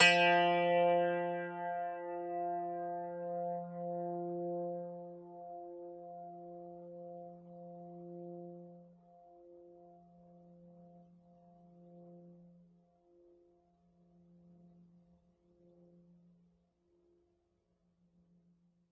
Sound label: ZITH F, zither; multisampled; world